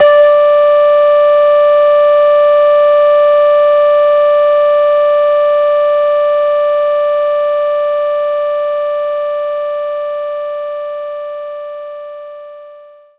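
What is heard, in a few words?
electric piano D5; knights try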